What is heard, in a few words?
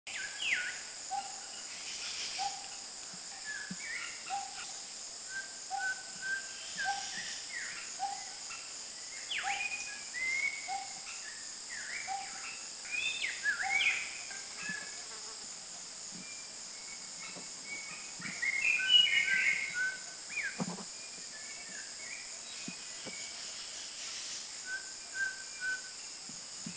White-vented Whistler Tabunan, Philippines; White-vented Whistler is another speciality at Tabunan